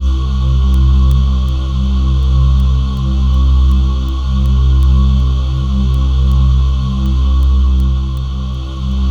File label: C2, Synth Leads